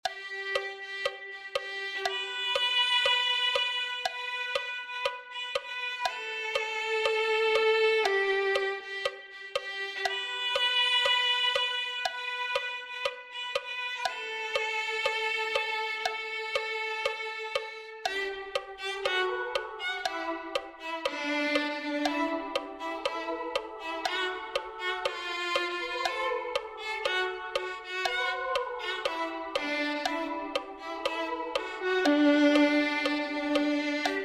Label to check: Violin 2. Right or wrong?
Right